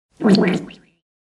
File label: Fart in bathtub bubble surface